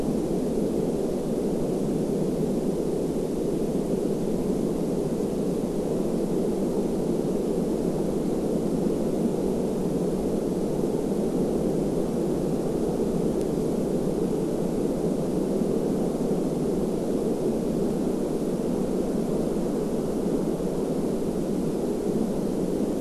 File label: WFR audio emfisis